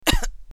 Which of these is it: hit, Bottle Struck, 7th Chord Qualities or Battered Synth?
hit